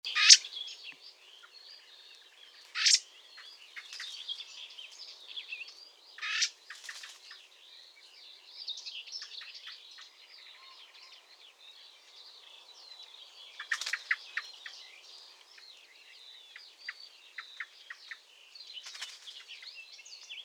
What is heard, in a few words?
Turdus merula; SETE